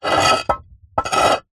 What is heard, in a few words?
terracotta roof tile movement on top of other; foley; zapsplat